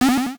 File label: checkpoint flag; Season Land